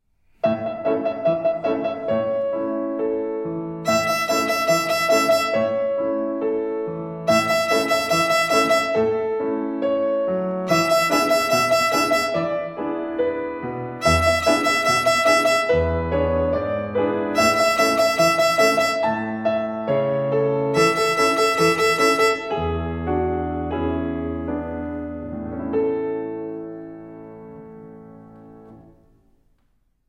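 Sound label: Rhythm on E Fifth Twinkle Rhythm, violin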